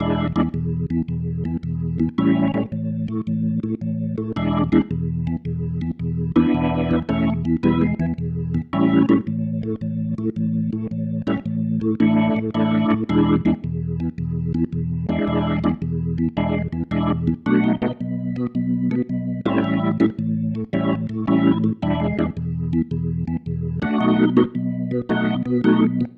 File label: Progression, Organ, Loops; Sonic Foundry (Sony Creative Software); Joe Vitale Organ Donor; Progressions